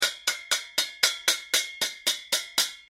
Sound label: pan bang triplets 100bpm